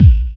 DR. LOOP, DRUMS; X-Static Goldmine 3

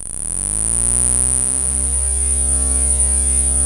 NL FX MIX; Masterbits; Vintage Voltage; SQ+FX

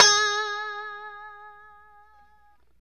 POP G#4, STRAT guitar; Spectrasonics; Hans Zimmer Guitars VOL-1; JC-STRAT POP

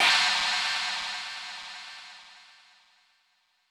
Crash E2, Roland U220

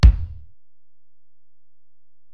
Ac BD, Alesis DM-PRO; 300 Drum Machines

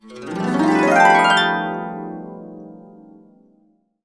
B, Harps; USB Soundscan vol.62; Complete Harps; AKAI

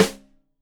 Rim Shot Stereo, drums

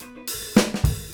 groove, Grooves 99-132bpm

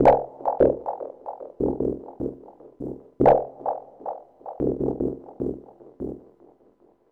Gate Bubbles, Musical FX; Zero-G Smoke

